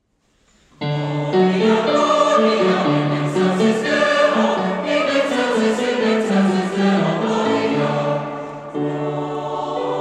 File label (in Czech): Ceska mse pulnocni, Gloria; J.J.Ryba